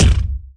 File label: kick